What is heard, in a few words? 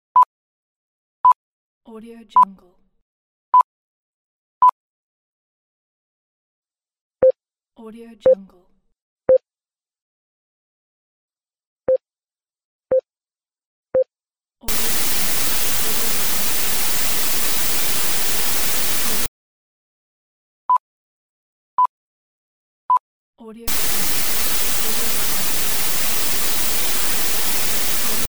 count down timer and tv